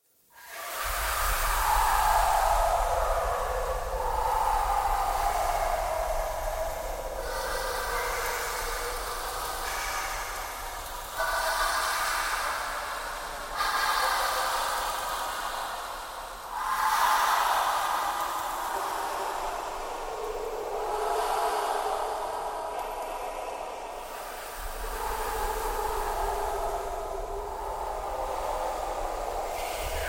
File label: breathing noise